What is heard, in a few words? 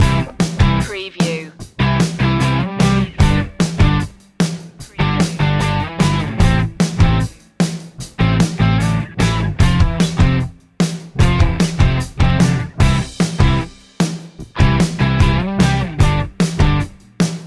active loop